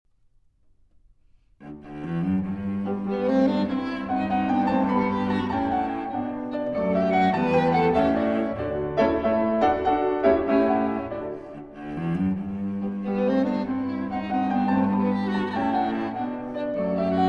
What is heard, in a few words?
Piano Trio in G Major, Scherzo. Allegro